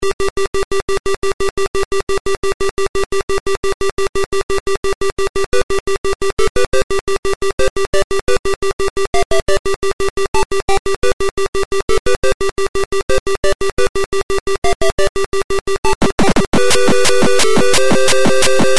Pot Band; starpot

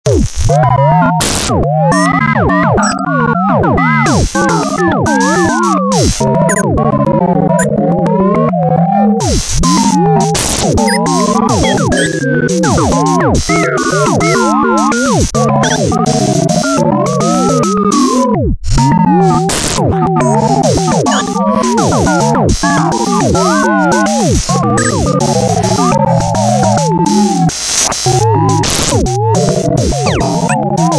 glitch kingdom; fruityloops

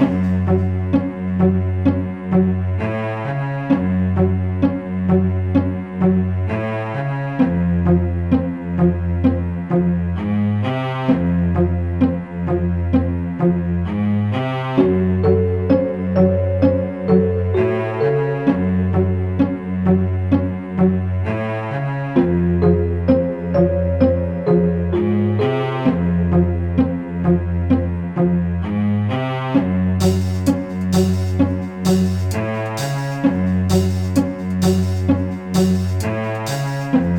ghost village; fruityloops

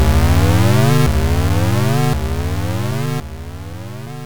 warning; deflemask

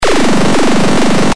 Game Over; starpot

